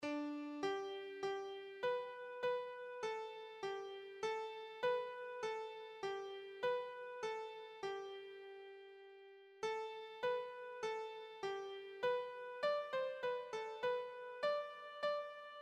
all hail the power, keyboard

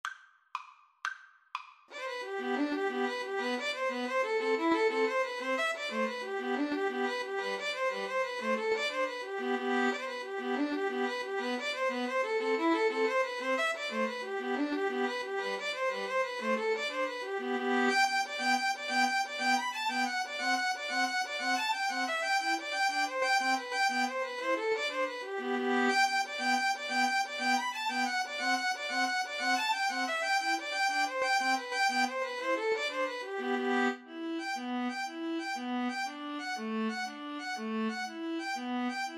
irish jig medley, String trio

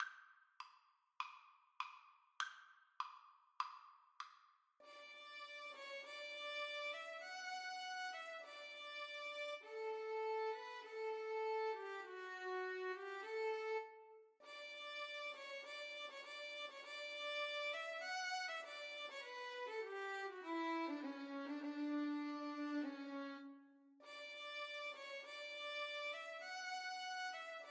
un poco adagio violin duet

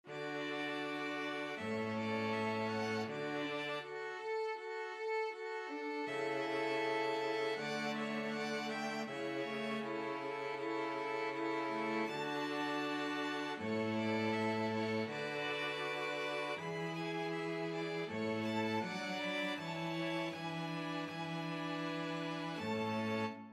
string quartet; Mozart12 10

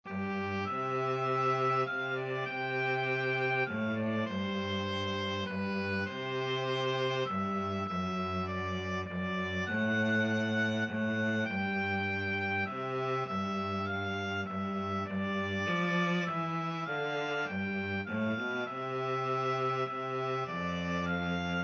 oboe cello

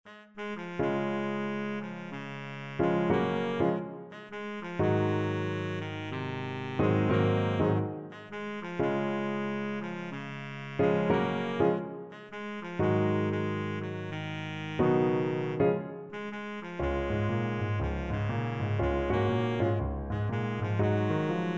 baritone saxophone

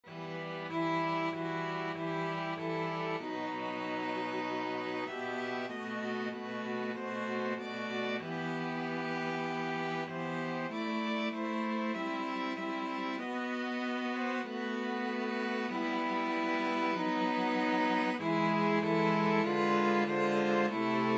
el cant del ocells, string quartet

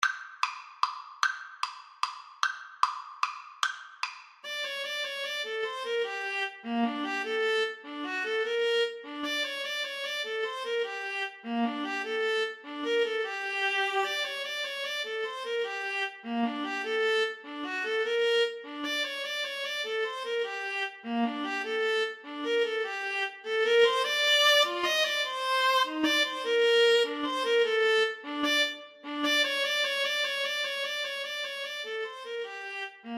Viola Cello Duet

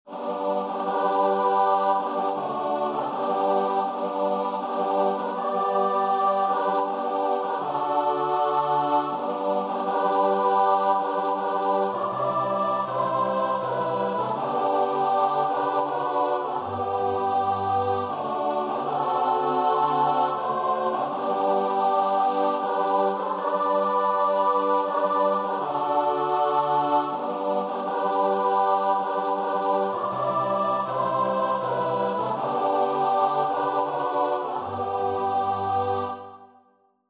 close to thee, choir

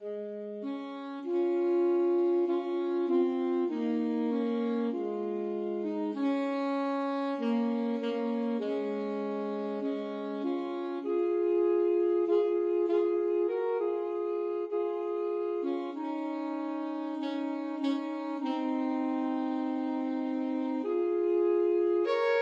were you there, alto saxophone duet

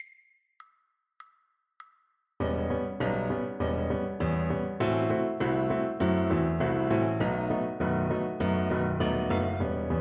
djangos stomp FL kar, Flute

